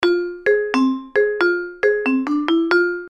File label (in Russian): vibrafon